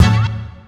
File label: Swoozer; Digital Sound Factory